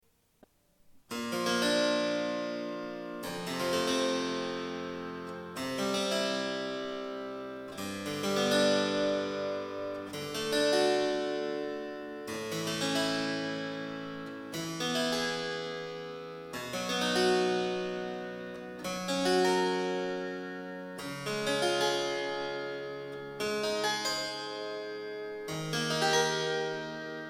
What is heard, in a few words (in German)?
cembalo